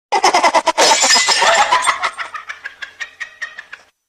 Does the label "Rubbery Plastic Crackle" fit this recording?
No